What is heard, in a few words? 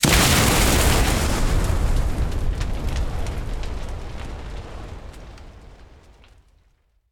plane explosion; War Thunder